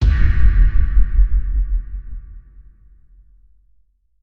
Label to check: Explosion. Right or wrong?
Right